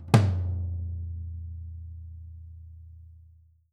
SD TOMS, Living Drums; Sampleheads; Peter Erskine

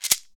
SHEKE, Afro Shakers, Shekeres

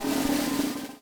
BUZZ ROL, snares; Spectrasonics - Burning Grooves CD2

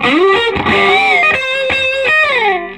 SOLO, Vintage Blues Guitar

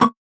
VocoBit Hit, 8 bit; musicradar; 8-bit bonanza

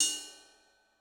Ride, Cymbal; Yamaha DTXpress-II

